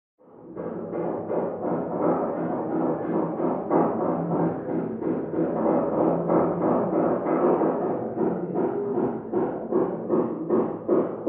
Ruido fondo obras

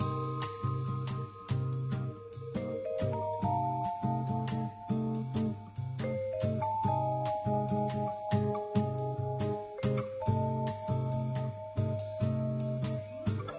Loop Bossa Juguetona; Linda Brown: Interactive Story; Android

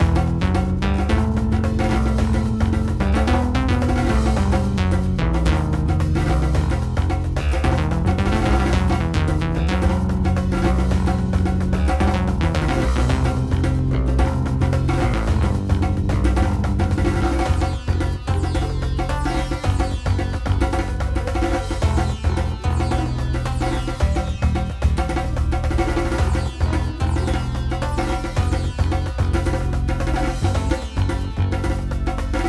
music volcan; Lootlite; Xbox Series X/S

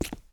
Paso zapato cemento; Yesterday Origins; Switch, PS4, PC, iOS, macOS

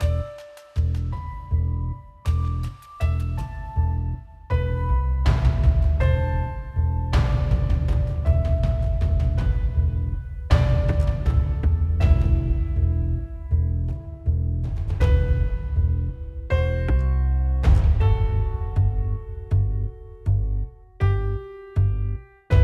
music creditos; Lootlite; Xbox Series X/S

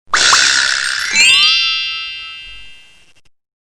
Fanfarria; Abrapalabra La Magia de Aprender a Leer

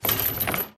CADENAS DRAG; Yesterday Origins; Switch, PS4, PC, iOS, macOS

